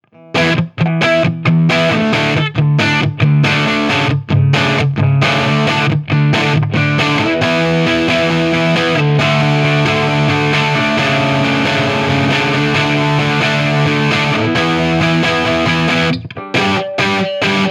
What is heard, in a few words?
TC15 DIRTY Marshall G12T75